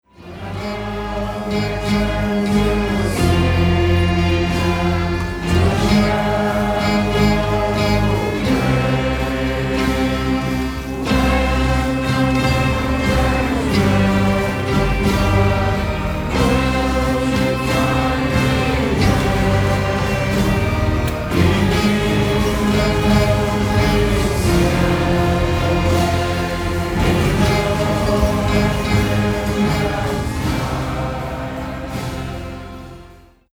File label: Classical Modern Medieval